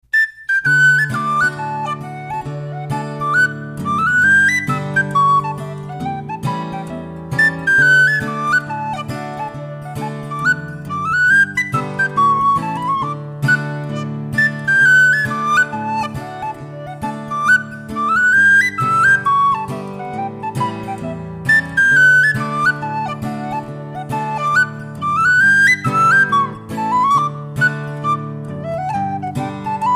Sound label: hornpipe, seafarer, dolphin whistle